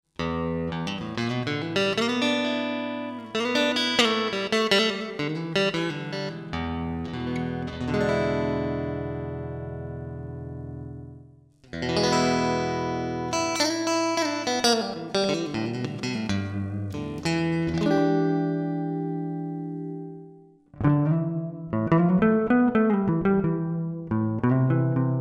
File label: Reussenzehn Black Face